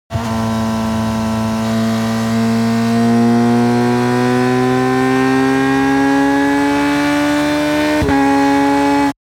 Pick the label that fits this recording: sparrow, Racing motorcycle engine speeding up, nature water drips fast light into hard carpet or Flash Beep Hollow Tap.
Racing motorcycle engine speeding up